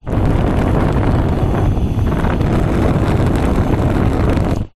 household cooker oven hob gas flame blow movement wind; zapsplat